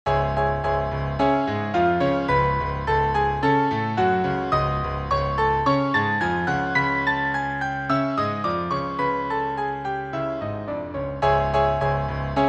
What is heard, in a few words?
fanfare; Opening Fanfare